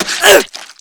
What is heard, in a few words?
splat; gesource; mk blockfort